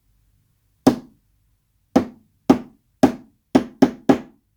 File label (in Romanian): ritm